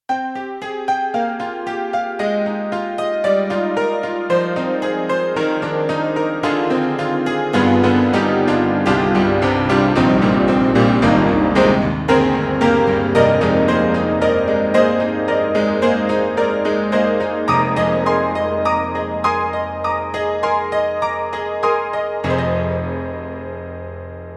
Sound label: Roland SC-88ST Pro Honky tonk